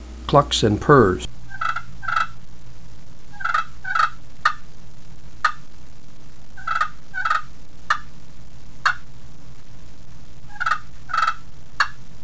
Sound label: alum clucks purrs, turkey; dixie